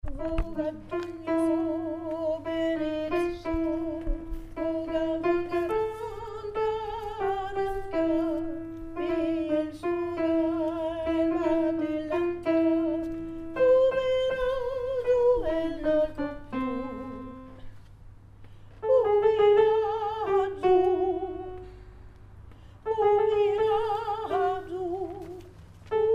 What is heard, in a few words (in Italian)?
regata alto, voix d alto; Regata Veneziana - G.Rossini